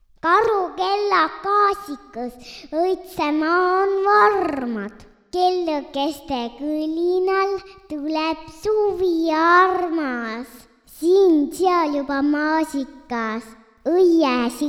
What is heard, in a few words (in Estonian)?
karukellad kaasikus + reverb; Laulupäev